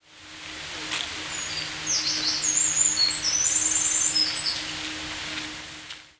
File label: misosazai, bird song; ミソサザイ; さえずり②; 長野県北安曇郡 白馬栂池; J-eco 鳥のさえずり図鑑